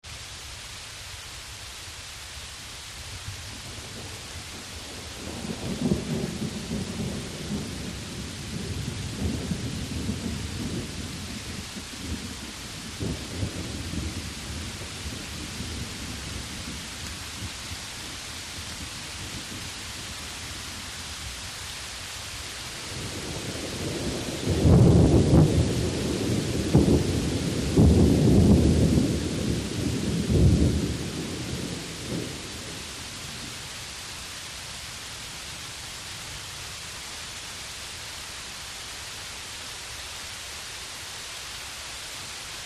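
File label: Distant Thunder Heavy Rain, Continuous thunder with very light rain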